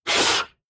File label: biter roar; factorio; Factorio 0.14.21; creatures